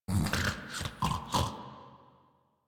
Snorts; Warframe; Deimos; Father; Vocalizations; en

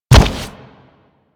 Step Metal, Footsteps; Warframe; Grineer

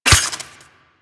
Melee Dagger Impact Robotic Flesh

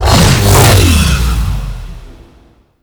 Tree Swing Woosh; Enemies; Abilities; Warframe; Teralyst

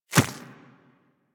Infested Small Walk Default, Footsteps; Warframe

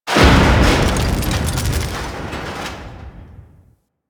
Reactor Explosion; Ambience; Warframe; Infested; Golem Trial

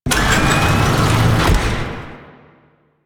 Camp Locker Door Open; Warframe; Quests; Veilbreaker; Kahl Camp

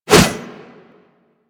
Pole Arm Whoosh; Warframe

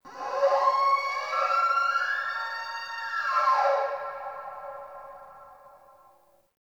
Wetland Forest Day Creature Distant; Ambience

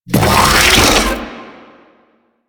exploding arm charge; Warframe; Man In The Wall

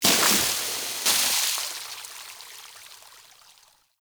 Water Barrel Destroy Splash; Objects

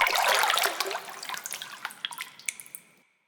Pull Out Of Water

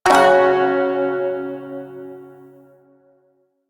Shawzin Chromatic Chord

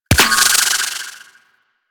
Gremlin Shot, weapons; DE; Warframe; Sentinel

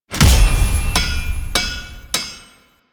Crafting Build, UI; Warframe; Cetus

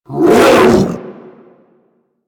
Infested Jetpack Attack Melee Swipe; Warframe

